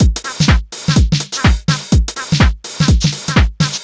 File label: drum loop triple full 125, Classic Chicago House; Sample Magic; Drum Loops